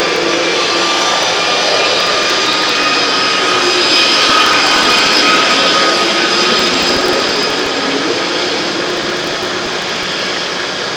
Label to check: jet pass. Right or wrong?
Right